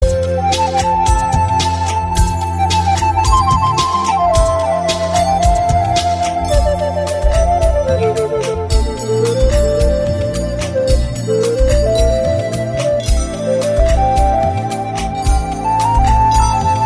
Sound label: Flute